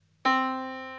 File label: Yamaha Disk, piano sound